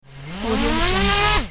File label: little drone gain